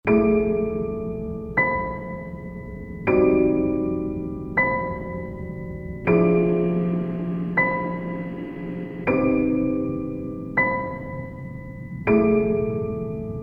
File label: piano quality change